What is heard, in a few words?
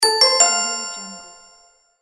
right answer